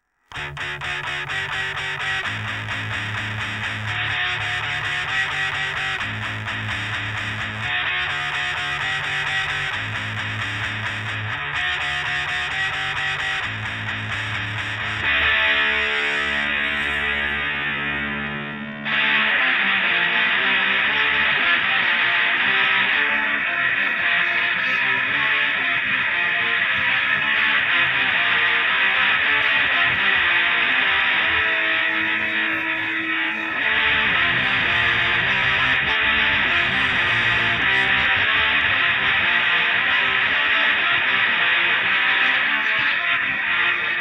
guitar

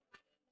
piano

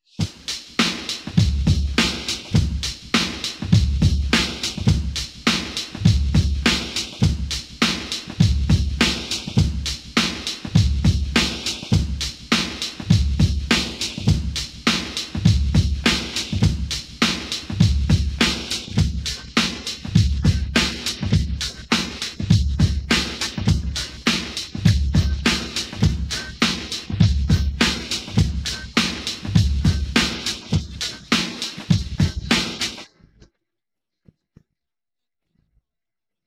drums

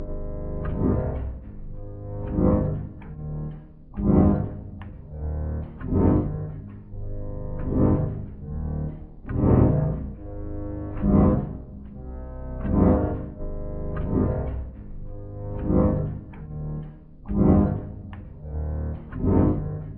lead

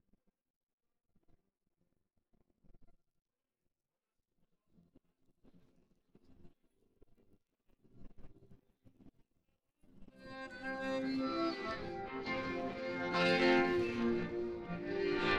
guitar

